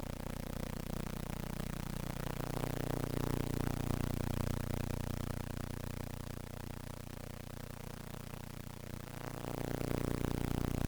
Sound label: ground loop noise, Ground loop video hum noise; Is the attached video and sound an example of ground loop hum noise?; VideoHelp Forum